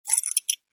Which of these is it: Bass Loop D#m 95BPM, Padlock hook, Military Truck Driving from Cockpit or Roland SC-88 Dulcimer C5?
Padlock hook